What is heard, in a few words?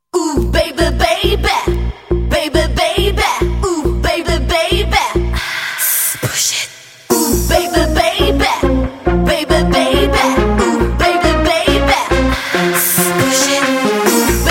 Techno Remix